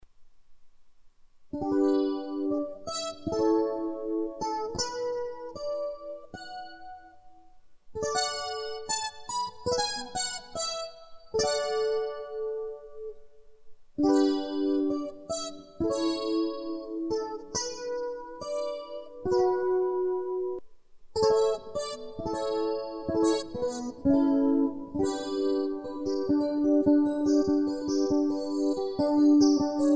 harpa; harpa de abel

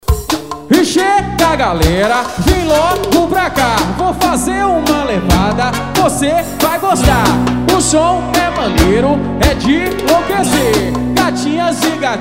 arrochas das antigas, mexer mexer, ao vivo

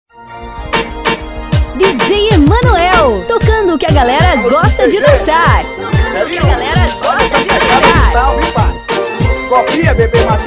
swing, remix

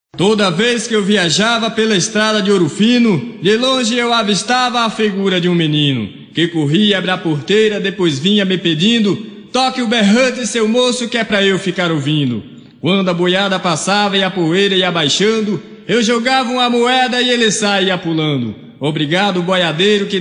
aboio

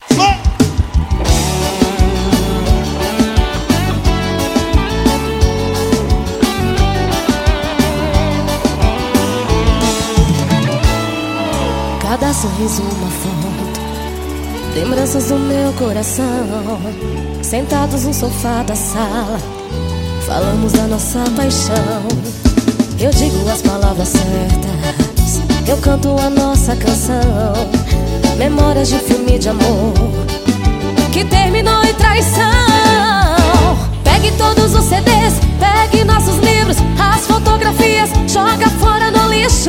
forro

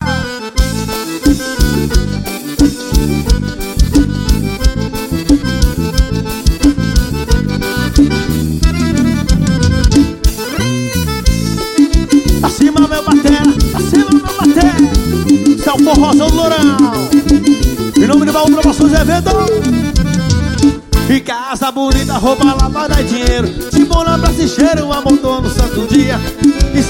forrozão ao vivo; recife

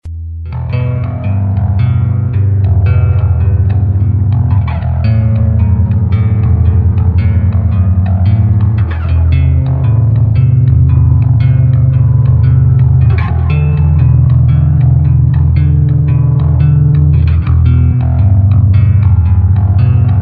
solo baixo

